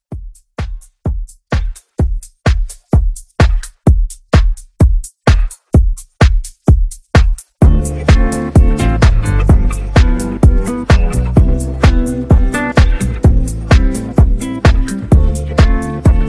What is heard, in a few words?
Latin Club, 128